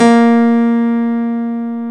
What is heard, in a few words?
PNO, Electrics, Grands; Club-50 - Foundations